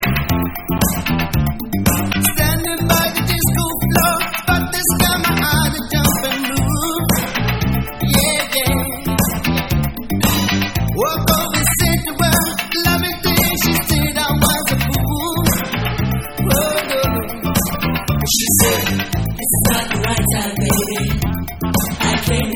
Vocal

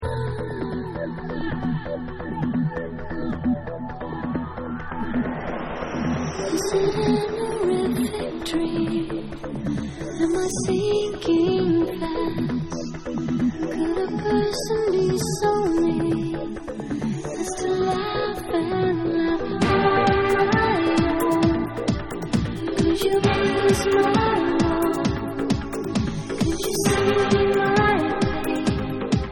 Club Vocal Mix

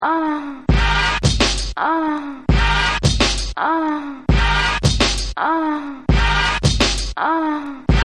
Scratch

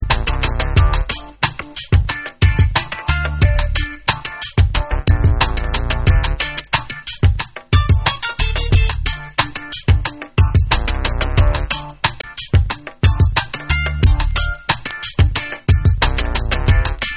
Instrumental

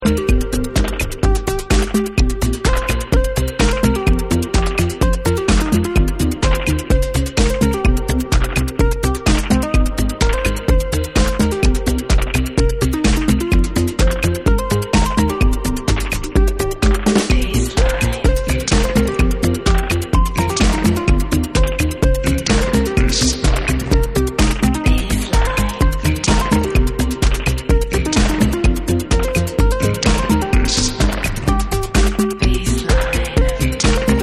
ITALO BASSLINE, 121 bpm

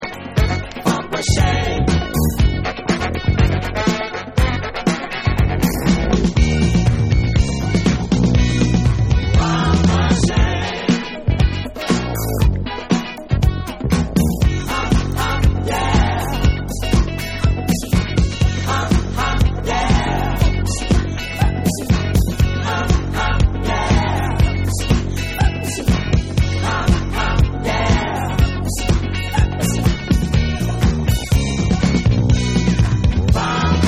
Disco

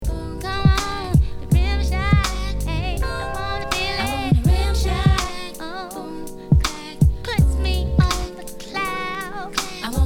RIMSHOT

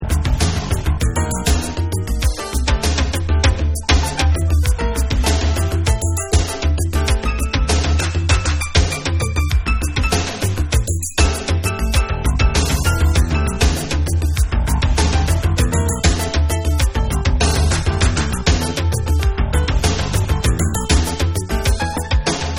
Dub

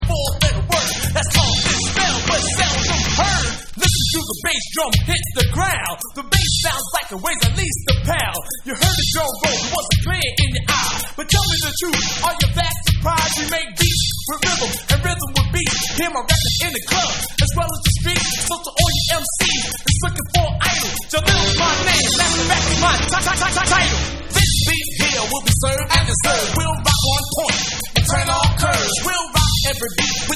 FUNKY BEAT

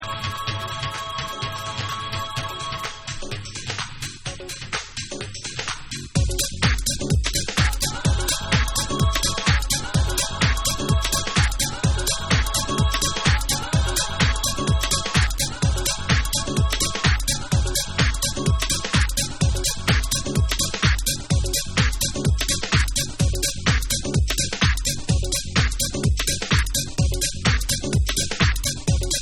Uk Trance Mix

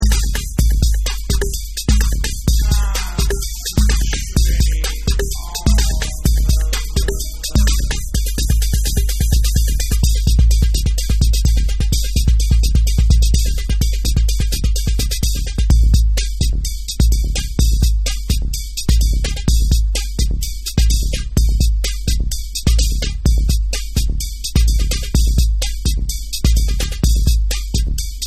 Basic Beats

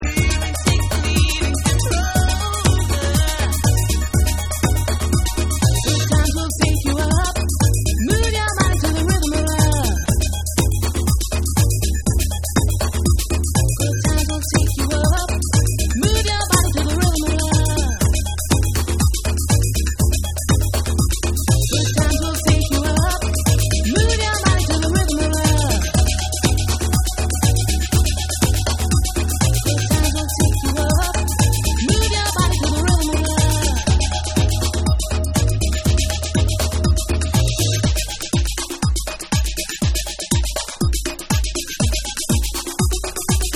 Percussion Mix

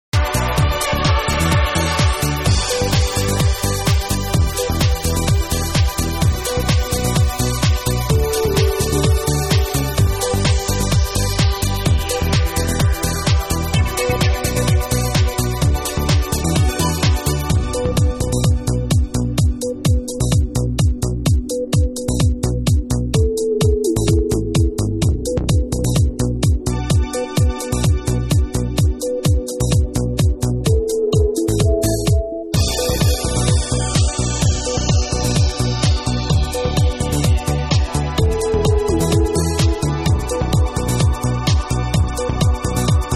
Extended Instrumental

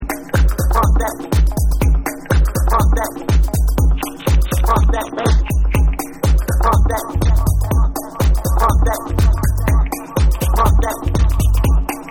BUMP DAT BASS

